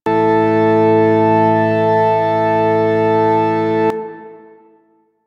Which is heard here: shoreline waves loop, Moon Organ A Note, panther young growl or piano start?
Moon Organ A Note